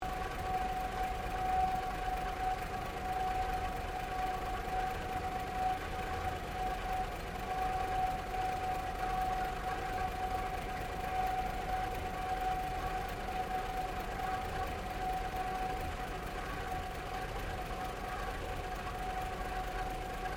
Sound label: Car Holden Hd Premier 1965 Idle Bonnet Open; Classic Australian cars; Cars (Sound Effects:Vehicles)